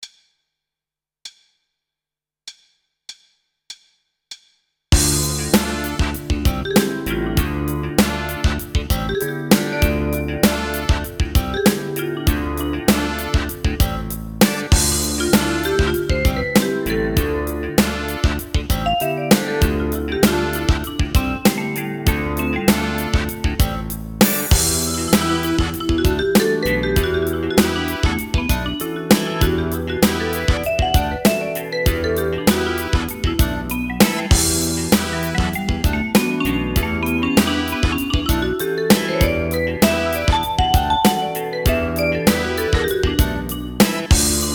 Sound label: Rock Funk